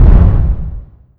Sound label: mancubus step; doom3